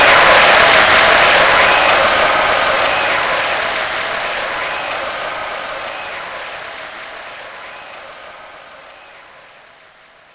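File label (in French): applaudissement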